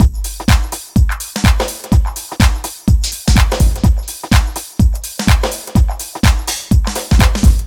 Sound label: LOOPS 125, House; Best Service ProSamples vol.10; AKAI